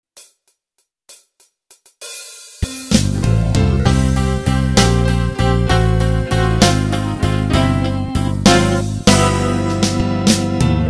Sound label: Blues